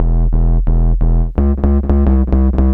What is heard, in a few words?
dc disaster bass, breakcore